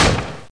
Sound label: hit wood